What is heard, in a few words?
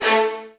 VN FX, Virtuoso Strings